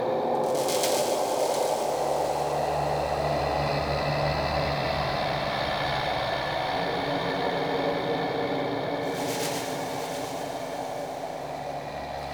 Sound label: Crypt Bed Small; Champion Crypt